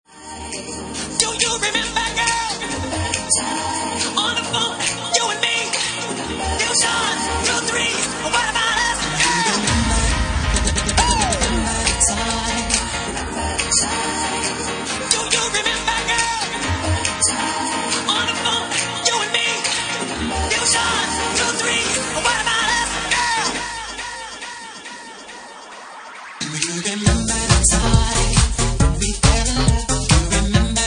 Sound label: Organ Mix